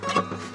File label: string blink, Guitars